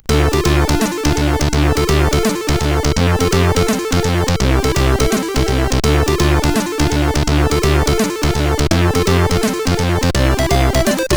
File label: Intro, CSG8580R5; SCS TRC